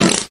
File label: fart short loud